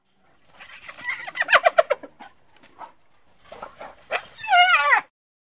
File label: Hyena Spotted Laughi